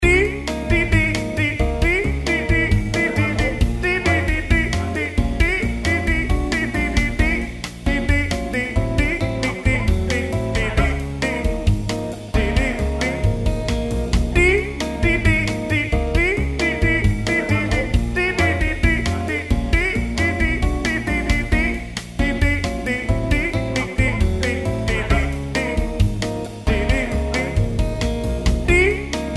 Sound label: On Hold Loop